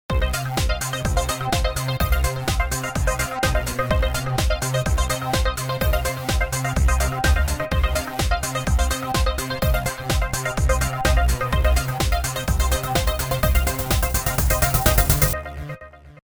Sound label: GaterSaw